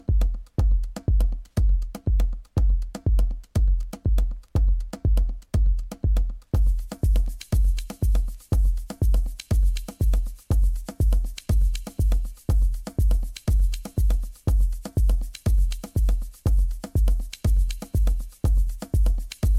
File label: beautiful yoruba soul mix